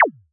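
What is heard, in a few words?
cancel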